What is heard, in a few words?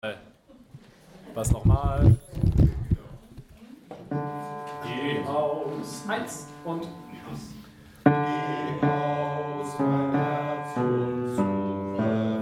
Bass; Geh aus mein Herz